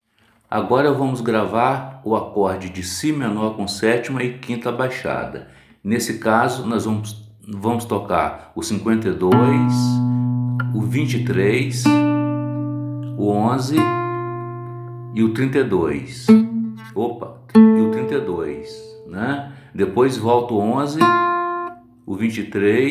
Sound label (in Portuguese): Si menor7 e quinta abaixada